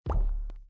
Block Destroyed; Scuba

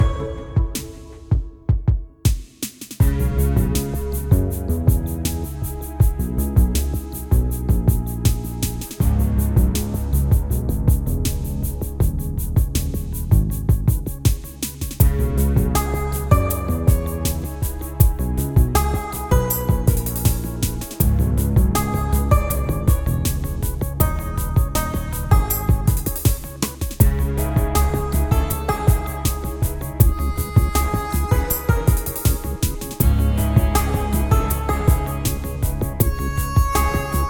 Sneaking Theme Western; DeadBirdStudios

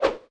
Swish

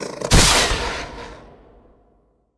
Barbarians Peak shot; Jokull

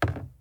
Place Item

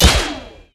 Crit

